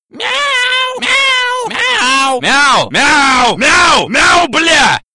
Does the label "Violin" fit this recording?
No